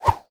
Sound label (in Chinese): throw 卡片飞行